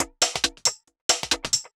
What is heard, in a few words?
Dance Grooves